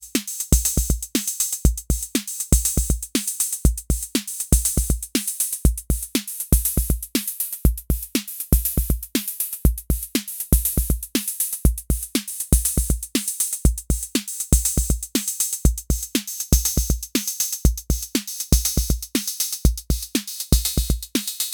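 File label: Roland TR-06 Boutique Simple Beat hi-hat Tuning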